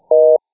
phone busy

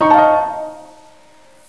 chord

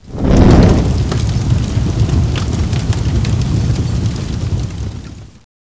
flame up

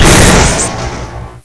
gauss

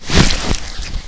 claw miss

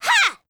haaahh

